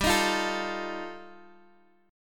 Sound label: G 7th Suspended 2nd Sharp 5th, G, Guitar, Standard; G7sus2#5 strummed